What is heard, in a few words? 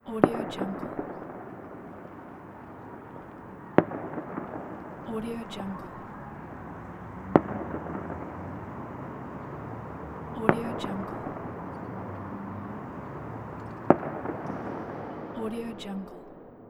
small fireworks far